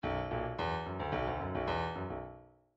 midi file grooves rock

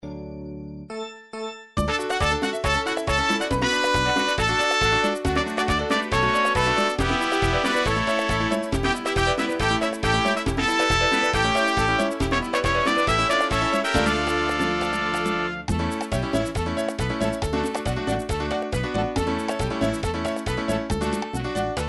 merengue

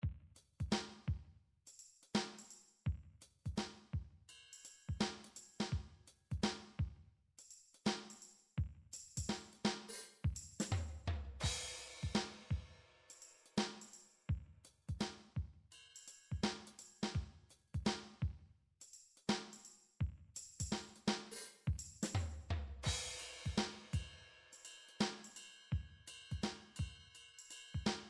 drum midi files up groove; Drum Midi Files Collection

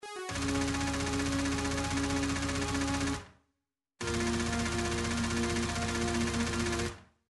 fatality; Gameboy; Mortal Kombat 2

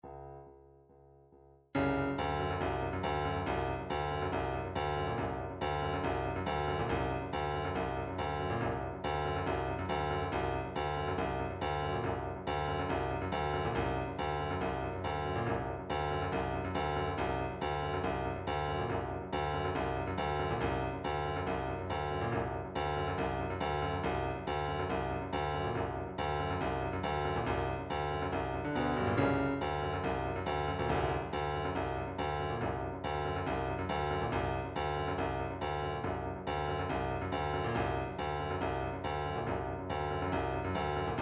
dance pop

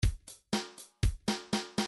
country 1bar

